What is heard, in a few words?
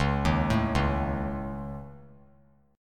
Db Suspended 2nd Sharp 5th; arpeggiated; Dbsus2#5; JGuitar